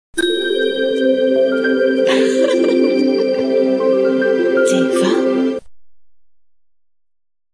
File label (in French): jingle pub; teva